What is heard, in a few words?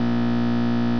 neon sign; cs jackpot v2